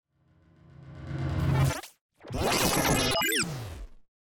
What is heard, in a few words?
Ascend Digital Distortion Rear To Front; Ascending; Movement Production Element Imaging Element Accent Transition; Blastwave